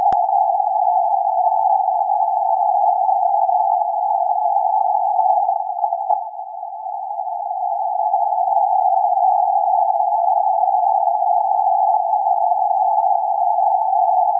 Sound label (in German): Rauschen